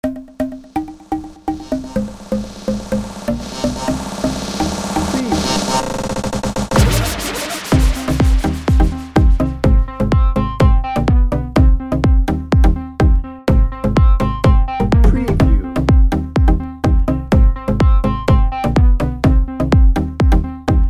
dance party edm bed

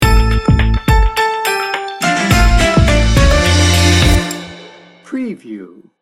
urban chill sting bumper

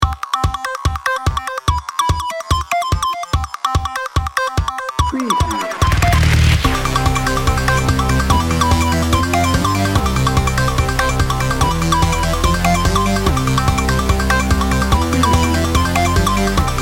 science and technology bed

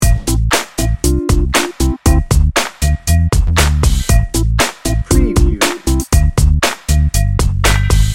slick chick loop

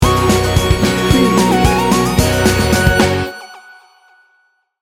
race to the finish sting bumper

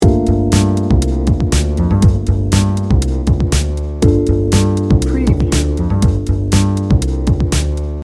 cool energy loop

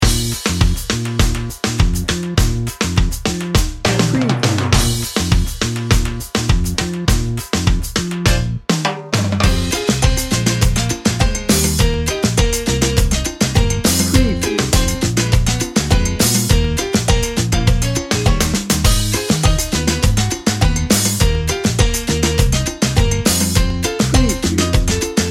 salsa fire bed no horns

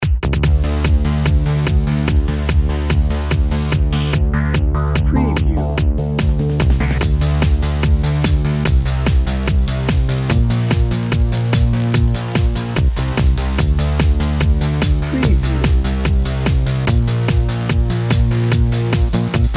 extreme action

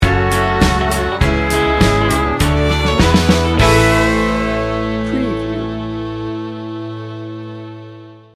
texas bbq sting bumper

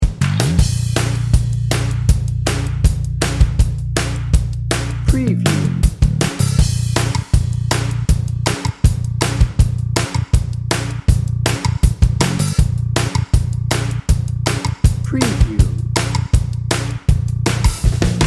retro memphis blues rock

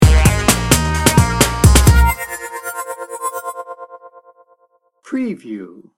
bouncy hip hop sting bumper

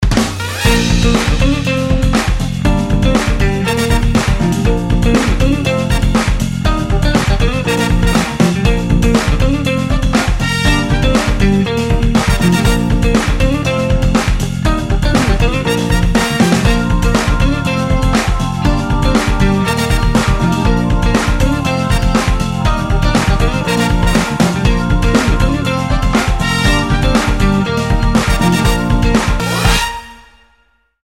happy feet

